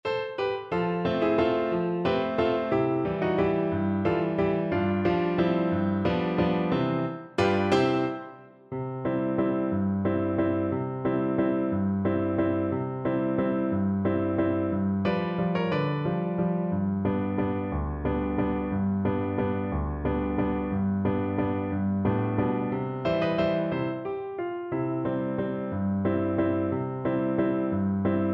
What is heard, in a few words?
chiapanecas FL kar, Flute